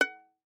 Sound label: Violin pizz ff sul E G5; MIS Pitches - 2014; Strings